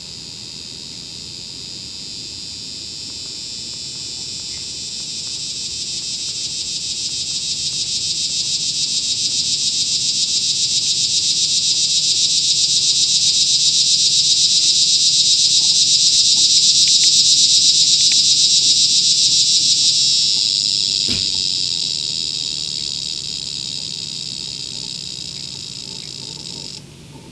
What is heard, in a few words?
linneia, Cicadas